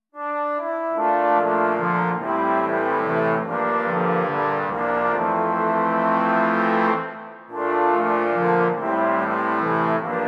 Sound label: trombone quartet